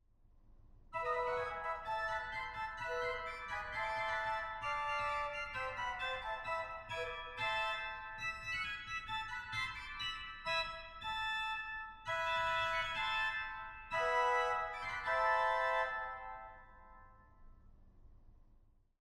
Mixtur, organ; goenn

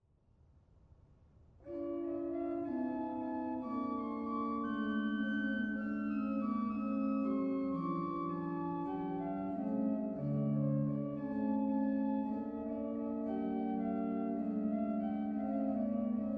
Concertfloete 8, organ; dortmund; Sauer

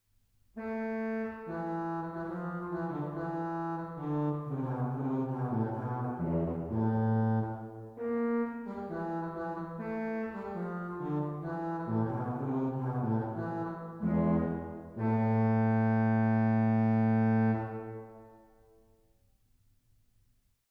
Trommet 8, organ; stade

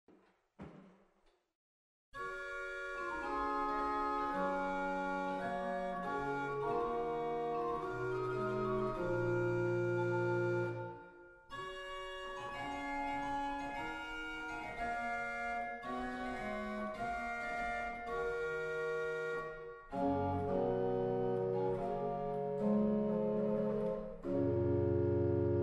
Nachthorn 8, organ; waltershausen

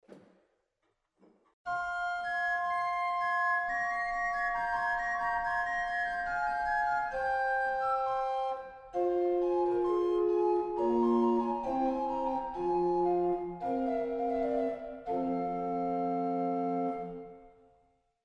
Floete Douce 4, organ; waltershausen

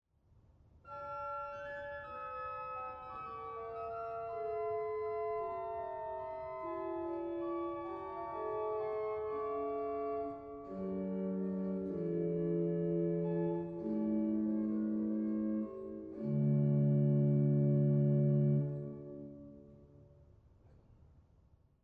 Traversfloete 4, organ; dortmund; Sauer

